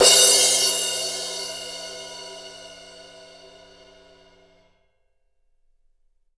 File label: CRASH 17 FF, Drums; Big Fish Audio; Ross Garfield Drums VOL-2; TUCSON KIT